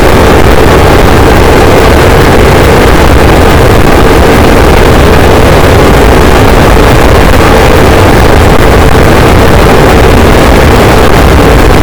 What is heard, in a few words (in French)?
cachalot positif, boat; Physeter Macrocephalus